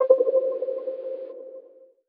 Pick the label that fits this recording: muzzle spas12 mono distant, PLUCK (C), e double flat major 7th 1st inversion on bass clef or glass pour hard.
PLUCK (C)